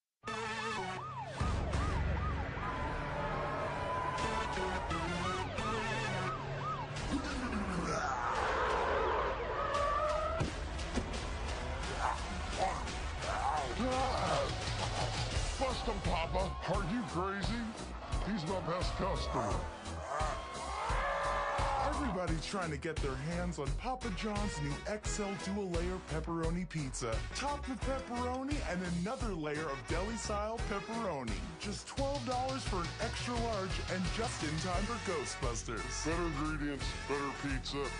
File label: papa scream